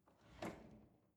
drawer cutlery open draw short soft

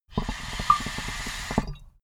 water pipe puff short

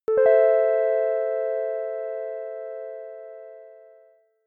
announcement keys minor

